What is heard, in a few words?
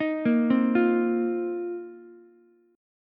F 7th Suspended 4th F down Ukulele; arpeggiated; JGuitar